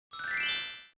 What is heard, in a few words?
ring